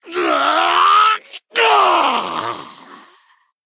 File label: Male Troll Death